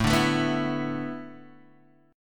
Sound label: A Suspended 2nd Suspended 4th A Guitar Half Step Down, chord